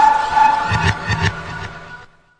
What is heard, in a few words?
Electro FX; Ueb HE Electro FX